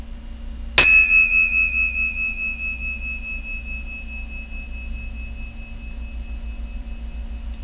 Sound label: tingsha; Meditation Cymbals